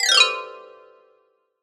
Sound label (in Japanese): 場面切り替え 木琴 下り; 場面切り替えや心理描写; 演出効果音